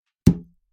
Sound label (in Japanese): コースターにグラスを置く音